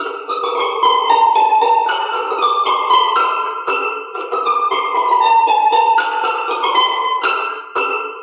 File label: strings sounds like scratching, Loops; Phonky